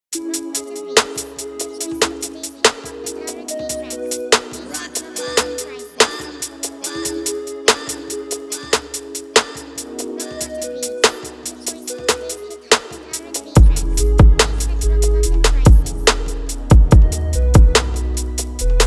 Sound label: BEAT